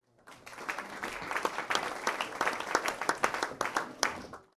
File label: Applause small Interior Crowd short burst; Human; Tom Hutchings